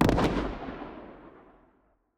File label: sticky bomb dist; Original TK Sounds